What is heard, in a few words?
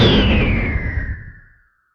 spaceship torpedo firing